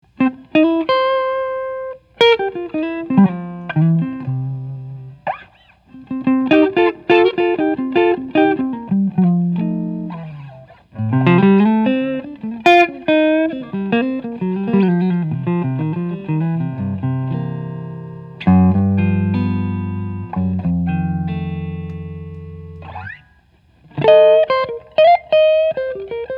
American Archtop amp, guitars; 2016; tiger maple; alpine spruce; elite custom

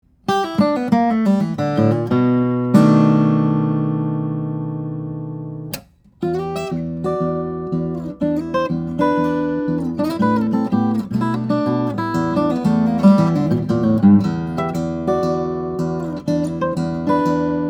steel string flattop guitar; bourgeois; oms; cocobolo; redwood

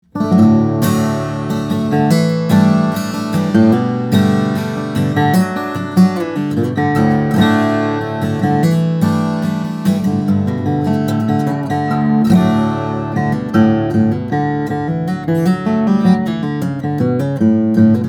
Lowden F-50, steel-string flattop guitars; all koa

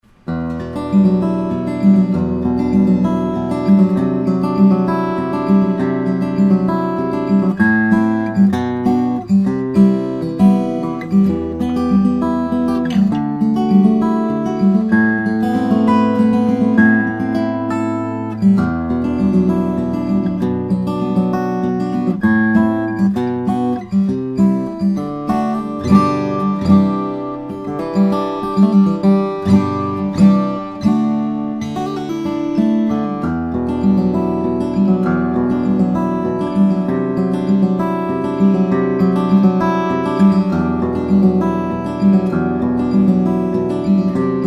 van linge 000 18q, steel string guitars, flattop; 000 om

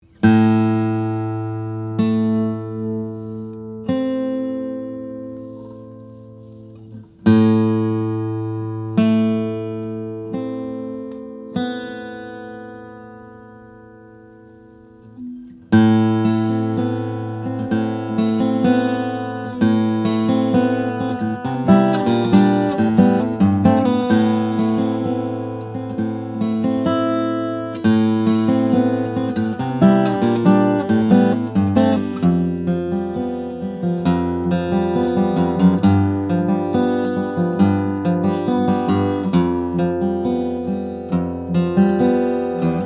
Lowden F-35, Koa/Redwood; decked out in Koa and Redwood with Maple bindings; steel-string guitars; flattop

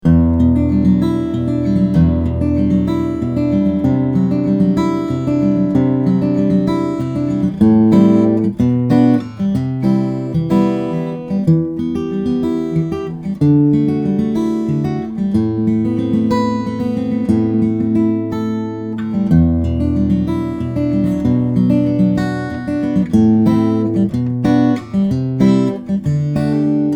Somogyi modified D, steel-string guitars, flattop

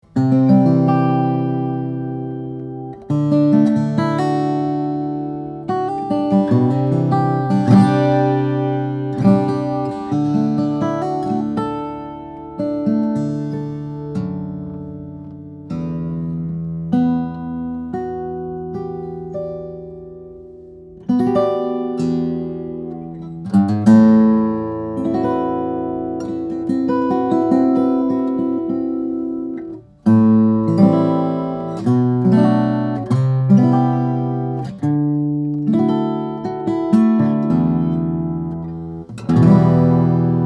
guitars; Bourgeois Morning Glory Odyssey; brazilian rosewood; redwood

